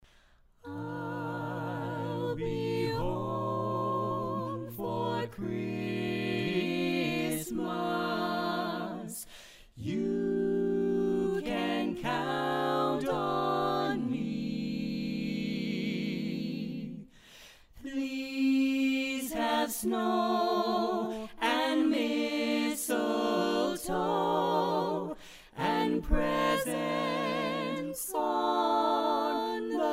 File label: Christmas Carolers